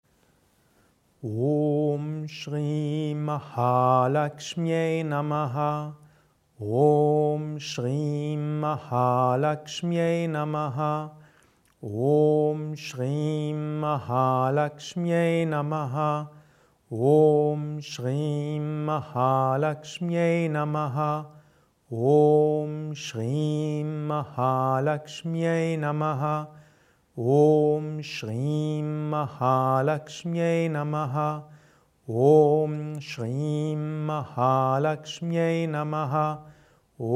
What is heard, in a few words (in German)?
Om Shri Mahalakshmyai Namaha langsame Japa Mantra Rezitation